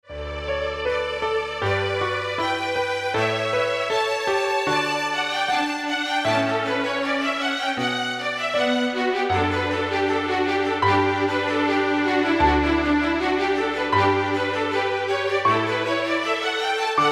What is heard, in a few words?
Piano Trio